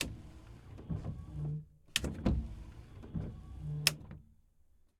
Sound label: Toyota Endurance Race Car - Windshield Wiper Switch On and Off; Toyota Endurance Race Car with Audi Engine; MS Decoded; Pole Position Production; GDC 2023 - Game Audio Bundle